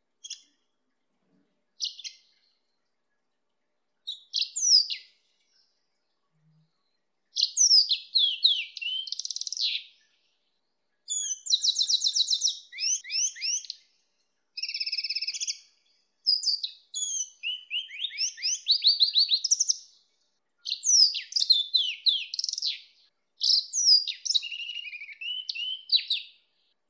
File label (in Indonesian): hibrid greenfinch kenari